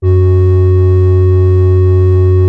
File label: LEAD F#2, Strictly Synthe, Smoov Leadz; E-MU Producer Series Vol. 6 – Analogia Project